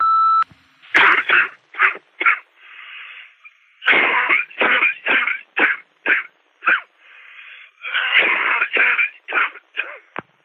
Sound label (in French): Toux; repondeur